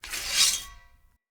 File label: Short Sword Equip; Enemy; Weapons; Short Sword Elite